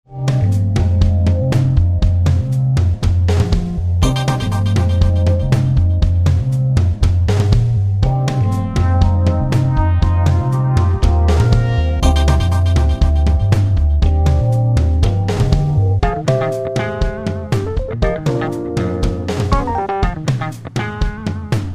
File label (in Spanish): dramaticos; musica libreria